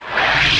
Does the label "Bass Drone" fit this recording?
No